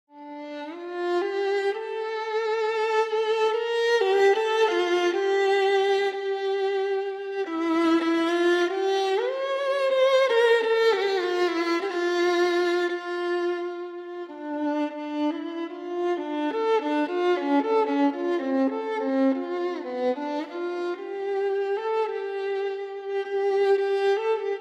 Adagio Arr. For Violin; Gayane; By Abram Yampolsky

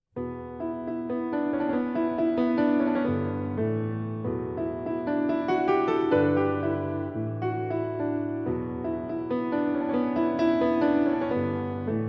Piano Solo

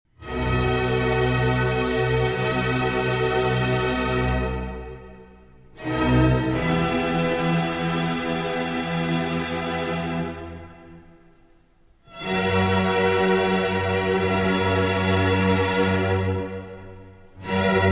Concerto For Strings And Continuo In G; Vivaldi Concerto For Strings And Continuo In G, RV 151 Concerto alla Rustica

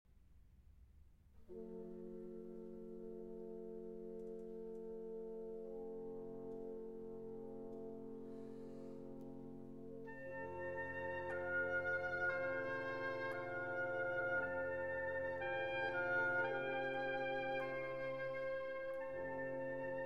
Violin Concerto in D Major; Op. 77; Brahms; II. Adagio

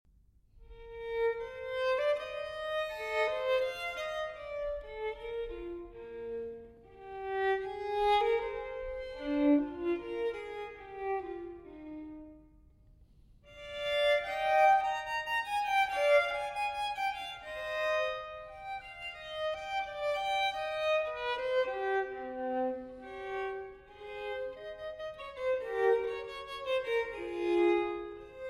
Solo Violin in E Major Piacevolmente; TWV 4021; Telemann Fantasia

